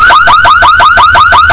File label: error; warningTone